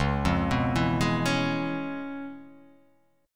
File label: Db 7th Sharp 9th Db down, chord shape; Db7#9 arpeggiated; JGuitar